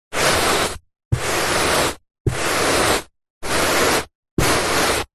household dustpan brush sweeping carpet several slow sweeps into plastic pan; zapsplat